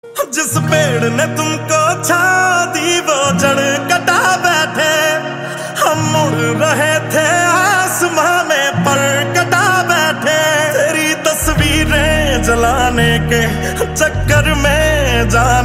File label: HINDI RINGTONE